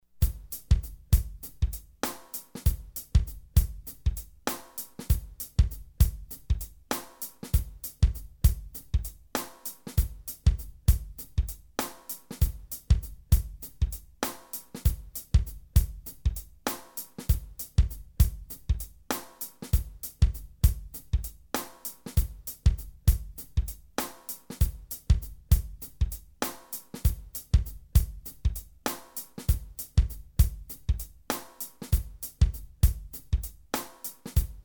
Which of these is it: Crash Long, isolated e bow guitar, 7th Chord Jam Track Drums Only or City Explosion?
7th Chord Jam Track Drums Only